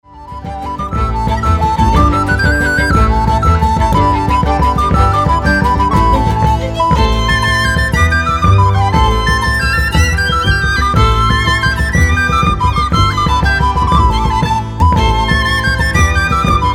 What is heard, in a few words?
Irish Bowl